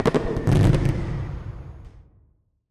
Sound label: twinkle far, fireworks; Minecraft; Votive World